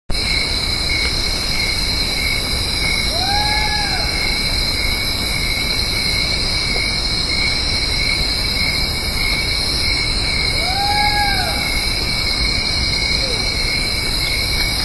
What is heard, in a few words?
Strix virgata; Ruta 101; Jorge La Grotteria